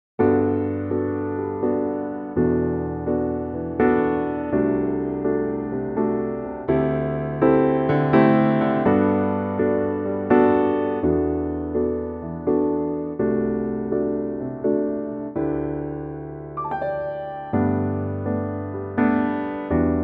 G piano

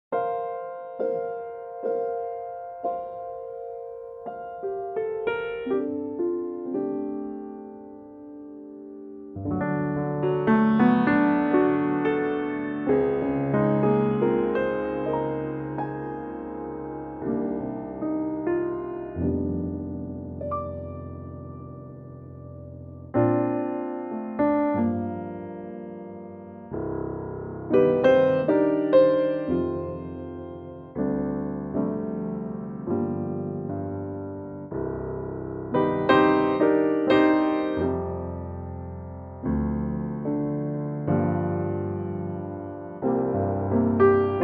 Bb piano; perfect for an intimate piano only arrangement, no tempo in mind

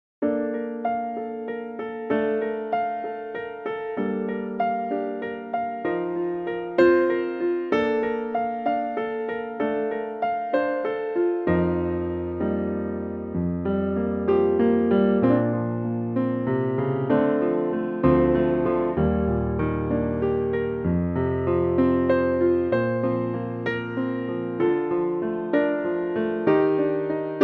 Piano Bb; key - Bb - vocal range - A to Eb

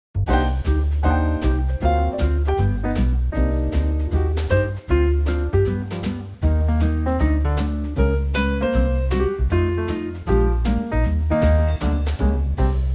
Eb 156 bpm; Quartet; key - Eb - vocal range - Bb to C; Quartet arrangement of the well known jazz classic, at a great tempo that's perfect for a swinging vocal.

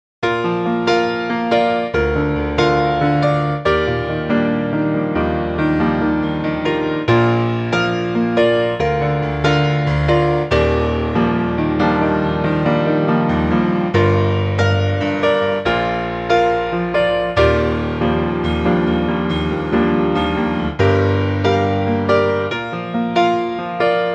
piano Bb; key - Bb - vocal range - Bb to F; piano only arrangement

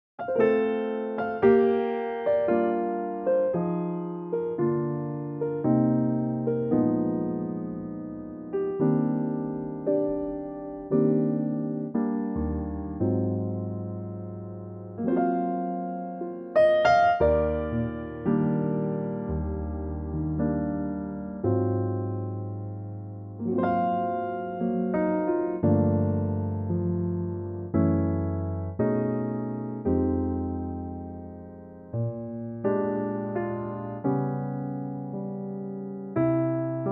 Eb Piano; key - Eb - vocal range - D to F

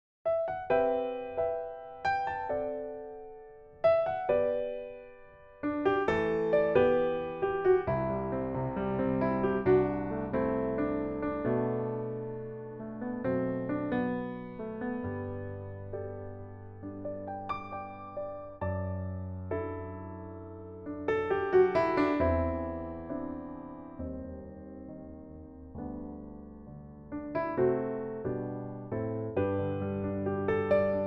G piano

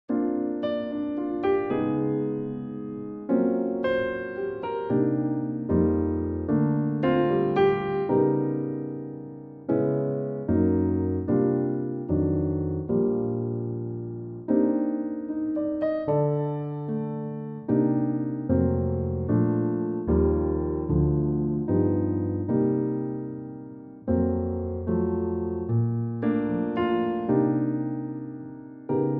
Bb piano

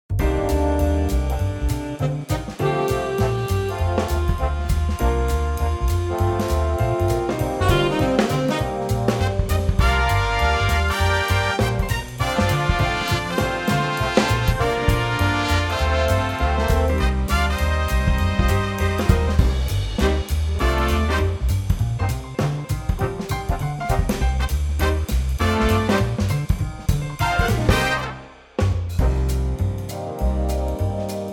Cm big band

